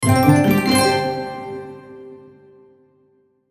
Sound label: Musical Twinkle; Musical Twinkle Strings Melody Ascend; Miscellaneous Musical Sound Effects